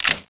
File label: clip in, weapons; spmg